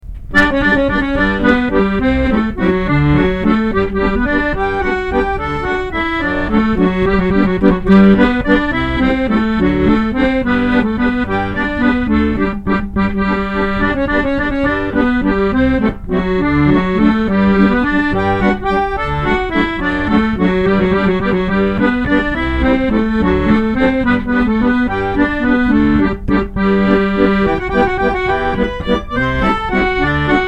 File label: Waltz in G